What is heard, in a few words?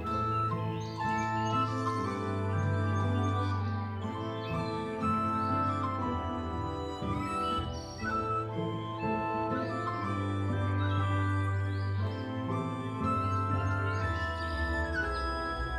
main game loop level 1; netent